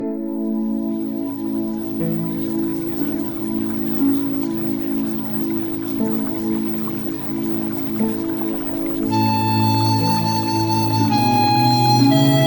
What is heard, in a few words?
Music; Premium Subliminals